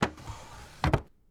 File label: Drawer Close; HauntedBloodlines; SFX Producer